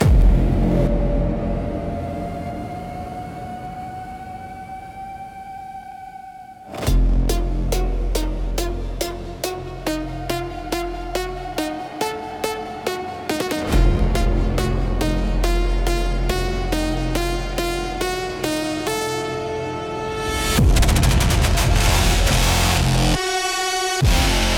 Shattered Alarm, aggressive panic for direct fear and jump